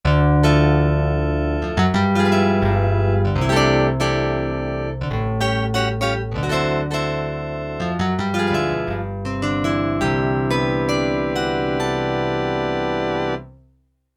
OCTAVE JAZZ GUITAR; Yamaha TX16W; Jazz Performance

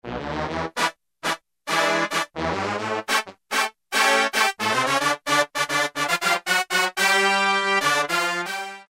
Oct Brass, Roland U-110; Factory Patch

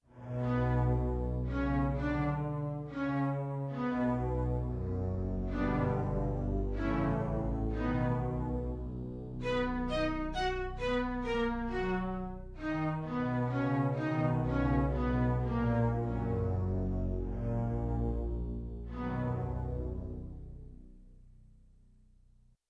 Stretched Slo String; Yamaha TX16W

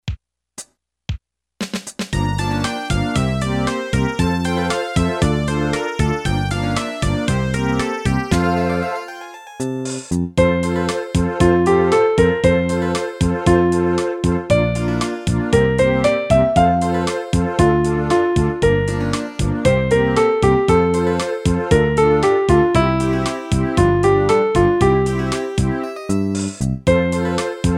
MINUET, Yamaha PSR-300, Factory Demo Songs

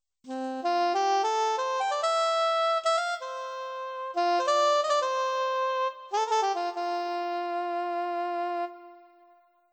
soprano sax, Yamaha PSR-530

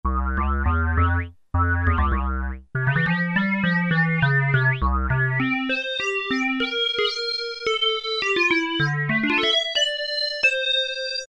Synthe, Korg PolySix; Factory Presets Bank B

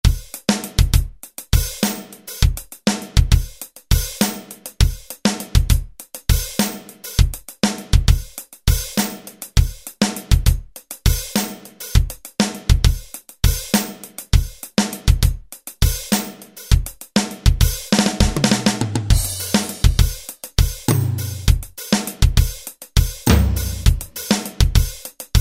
Jazz Rock, Alesis SR-16; Preset Patterns

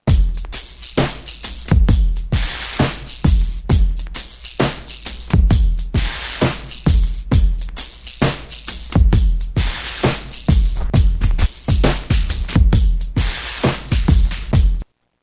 Odd FXM Loop; Roland M-DC1

